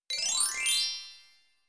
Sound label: pop up